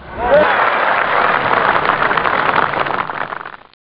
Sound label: claps